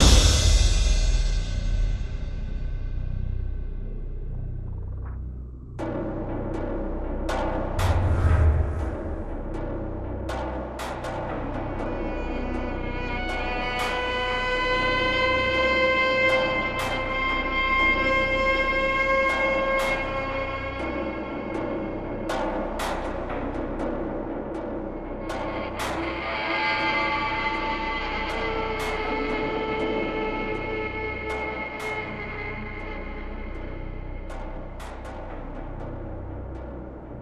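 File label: PERCUSSION AMBIENCE loop; MATRIX OF LEAD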